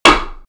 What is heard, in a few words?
dropping wood on wood